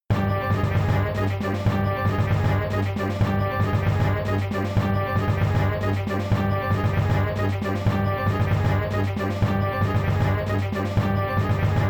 Match Sequence; Indiana Jones The Pinball Adventure; Williams; arcade

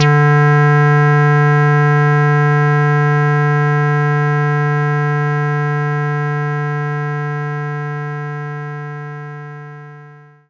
square wave D; knights try

